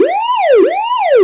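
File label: yelp siren; gmod; vcmod; els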